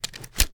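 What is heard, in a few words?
shotgun reload start fast twist; iw8; romeo870; gmod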